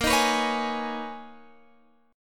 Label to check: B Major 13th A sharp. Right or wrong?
Right